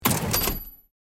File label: Cash register open ding; shopping